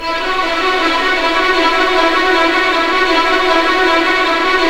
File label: RIVER; East West; Scoring Tools; ORC; River Flow